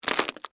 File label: Texture, Crunch, Short, Pasta, Shells; Crunches (Sound Effects:Objects)